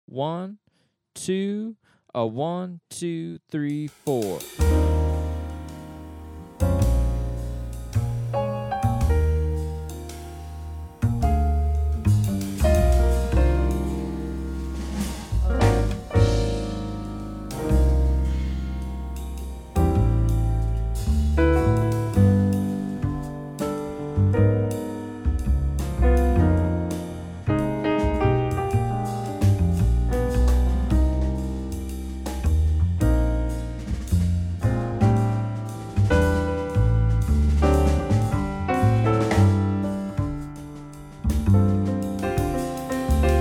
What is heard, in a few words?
No Trumpet, Play Alongs, Quartet